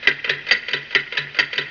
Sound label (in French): Horloge